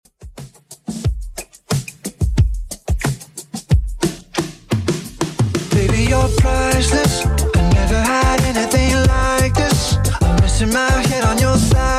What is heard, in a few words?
Top40 Redrum 90bpm